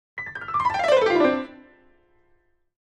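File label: Grand Piano Short Descending; keyboards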